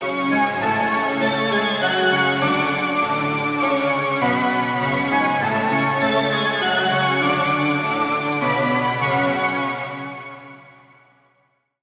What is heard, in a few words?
Key, Spire; Routed Sound; Spire Expansion - Street Vanquisher Vol.1